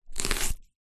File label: knife slice onion